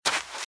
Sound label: Footsteps - Sand - Dry - Run - Right; RadwinIsland